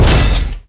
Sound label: Hit Combo